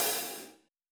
HH mid

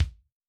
BD Dub; smile

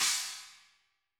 CYM 10 HSH; sonic

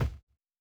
Bd Hot Valve; reHash

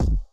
Bd2 Optical

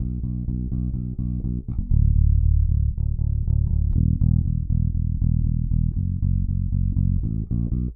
going up

